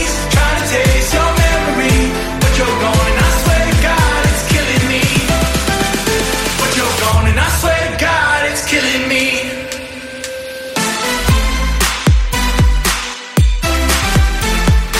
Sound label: Ringtone Iphone